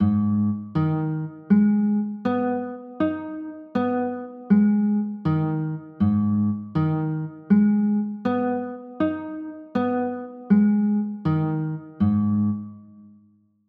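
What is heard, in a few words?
G sharp m6 chord, guitar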